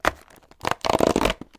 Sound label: opening a paper box; eating